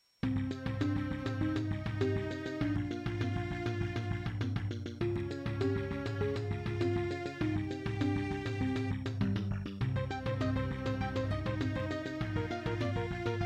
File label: Labway LWHA111800 (AdMos QS700); Hocus Pocus